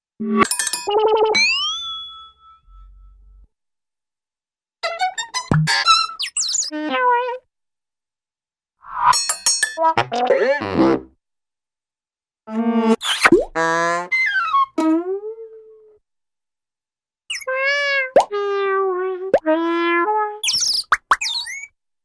Various Horns Used w-boings, Bird Chirps, Pops, Etc; Cartoon; Hollywood Edge; Cartoon Trax